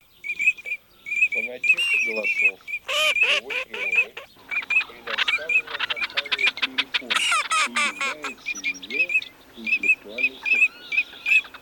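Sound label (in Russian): Чирок-свистунок Alzavola, манок; plurifon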